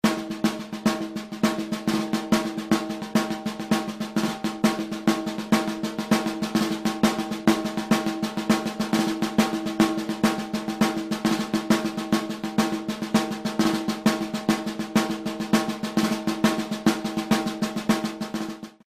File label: caixa; le bresil